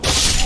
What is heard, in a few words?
katana hit; Dark Souls III